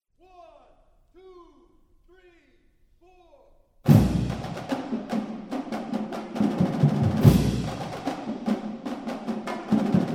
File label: Tiger Band Pregame Touchdown; LSU